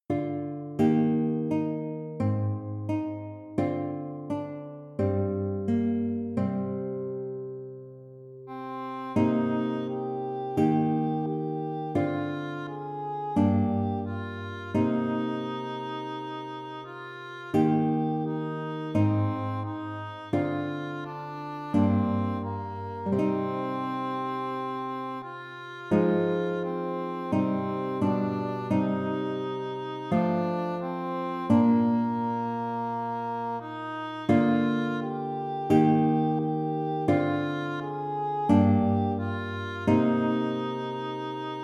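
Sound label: o come o come emmanuel guitar english horn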